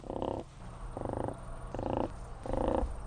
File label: female whooping crane contact call